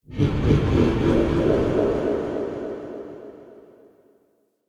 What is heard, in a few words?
Evil Spirit; Peculiar Mod